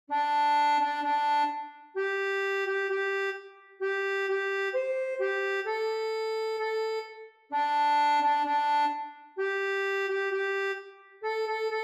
ACCORDEON

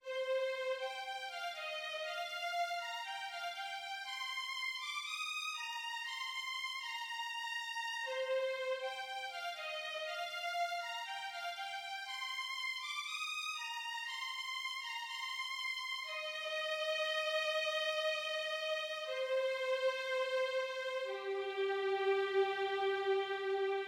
ALLELUIA VIOLON; ALLELUIA "Colombière"

